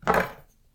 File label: bringing kitchen knife; cooking